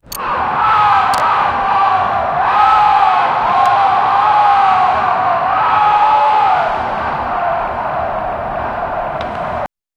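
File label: medieval battlefield ambience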